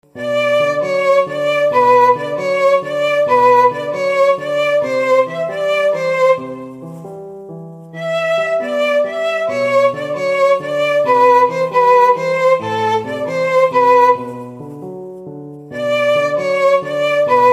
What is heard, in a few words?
violon